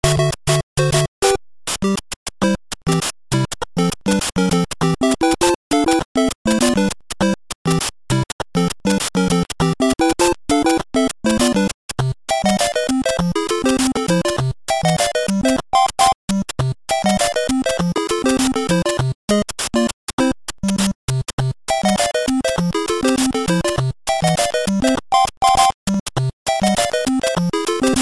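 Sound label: 8-bit music for cell phone ringtones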